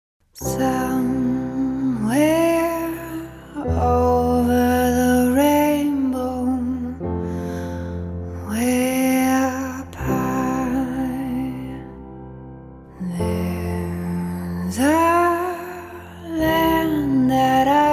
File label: Marilyn Monroe Impersonator